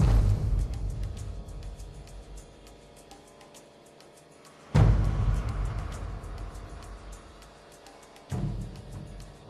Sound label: percussion loop